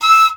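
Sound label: piccolo staccato E4; Flutes; DirectWave; Varazdin Orchestral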